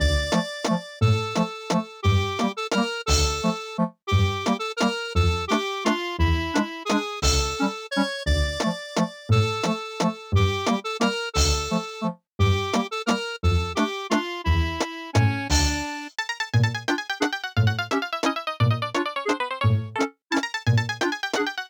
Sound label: Waltz (Aircheck, Retro)